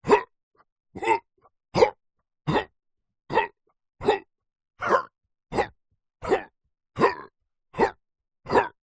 male voice grunts strain repetitive work out; human; zapsplat

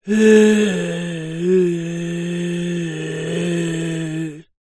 horror zombie groan child

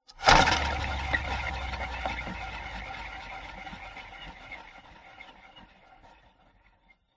wood spinning wheel spin rotate stop; foley; zapsplat

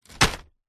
plastic bag thick set down or drop; foley; zapsplat

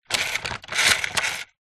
leisure rc toy car wheel turn motor plastic

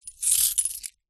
coins movement hand; foley

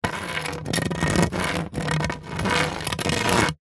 wood pole stick scrape drag on concrete; foley; zapsplat

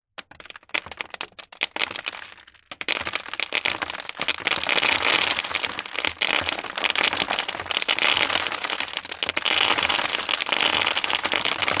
stones small debris pour tip into cardboard box; foley; zapsplat

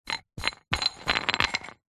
sport dumbbell collar spin tighten; zapsplat

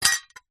tin small lid set down

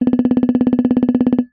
cartoon running fast taps; zapsplat

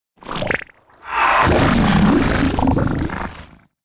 science fiction movement slimy weird; zapsplat

